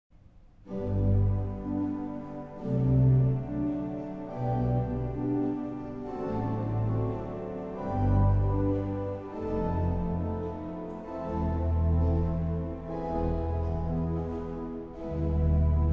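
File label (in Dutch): Psalm, orgel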